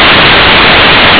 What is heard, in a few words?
noise